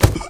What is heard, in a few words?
pain